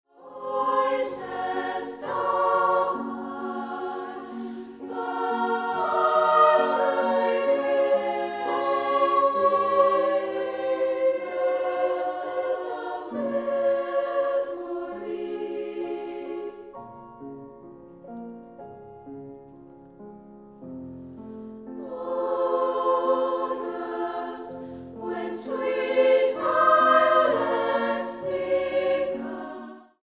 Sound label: Childrens Choir Music When Soft Vo